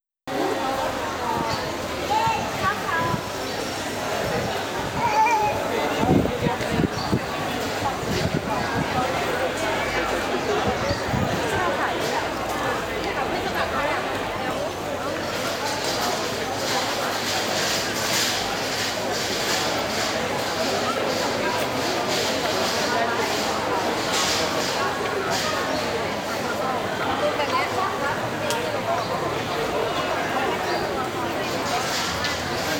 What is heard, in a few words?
Bangkok, fieldrecording